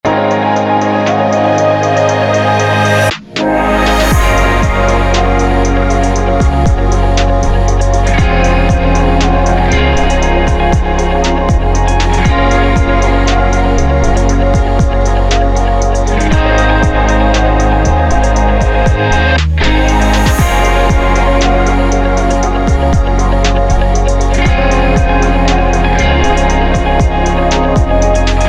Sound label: Ambient